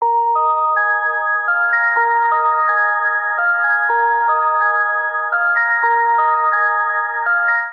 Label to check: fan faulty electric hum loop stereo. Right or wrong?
Wrong